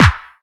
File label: Dance Mega Drums; Best Service